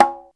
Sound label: conga heel, percussion; Back In Time Records - Big Bang